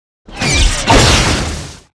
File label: Knock Wide; Seer; GamigoNA